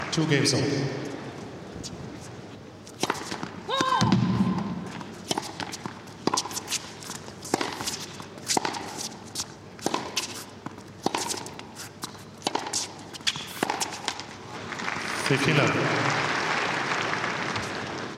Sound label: Tennis tournament match